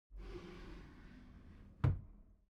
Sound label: office desk drawer close soft; foley; smartsoundfx